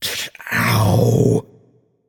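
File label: nonverbal ouch; voicepack; smite; merlin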